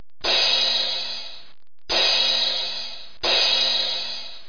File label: cymbal; Sound Effects Library 1.5